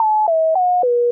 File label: Beat